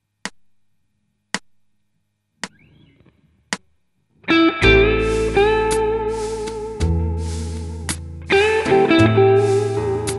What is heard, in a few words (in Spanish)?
blues menor armonica